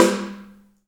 HAIRCUTS, KENYA DRM, Heart of Africa

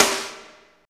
SNR, Tekno Industrial; East West

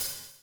BUBL, Backbeat; Spectrasonics - Backbeat

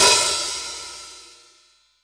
HH OP, Drum Dry; East Collexion; Drum 1

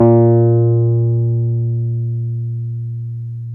PNO Wurly; Keyboards of The 60's and 70's

